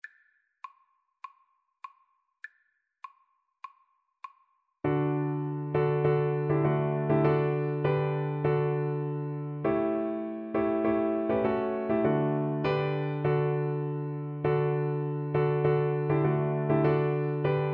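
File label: VLC karaoke, Cello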